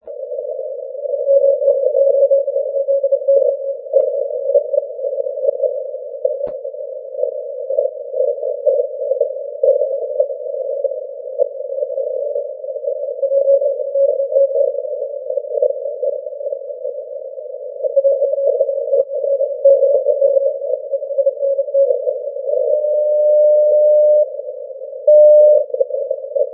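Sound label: 40m continuous wave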